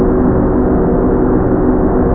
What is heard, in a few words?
radio